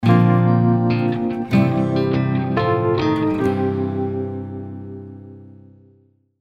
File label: Wings